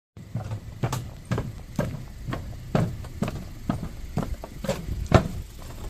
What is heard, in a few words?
Walking on metal